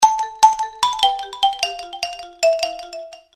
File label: message, notifications; Coolpad; 5010